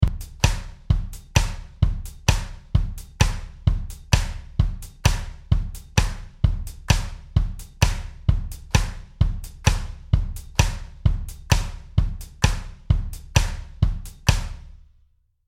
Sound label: Drums